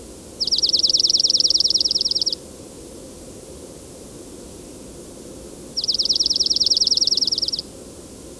Dark-eyed Junco; ibm glen

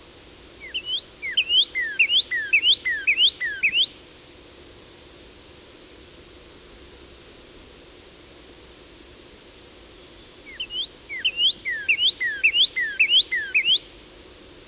Northern Cardinal; ibm glen

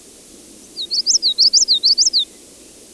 Common Yellowthroat; ibm glen

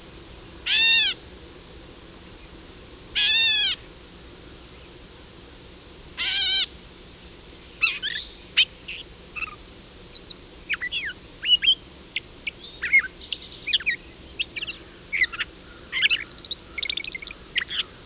Gray Catbird; ibm glen

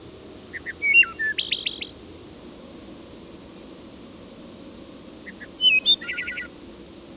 Wood Thrush; ibm glen

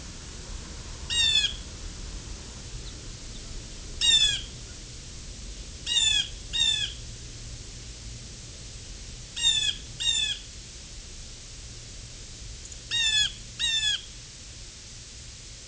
Blue Jay; ibm glen

